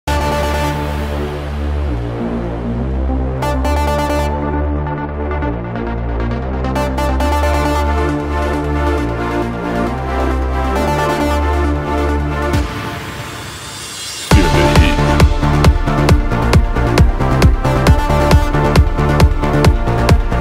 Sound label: new eurodance super hit